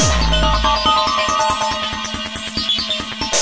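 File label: hardcore 1hit, 140 bpm; REMIXABLE COLLECTIONS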